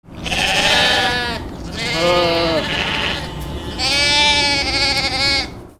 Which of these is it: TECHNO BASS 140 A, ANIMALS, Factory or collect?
ANIMALS